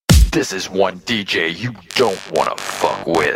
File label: Owl